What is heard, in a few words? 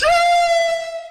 guy screams sheesh